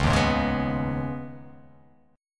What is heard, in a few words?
Db 7th Suspended 2nd; JGuitar